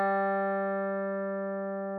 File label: G3 196.00Hz 8 string; tuner